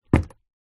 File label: foley footstep single shoe business leather buckle zipper womans on wood floor